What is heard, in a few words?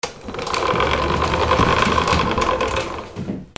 GARAGE